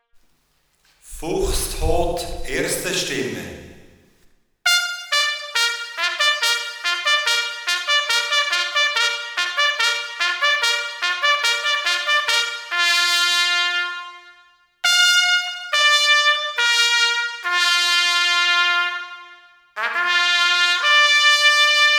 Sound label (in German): Fuchs tot, Jagdhorn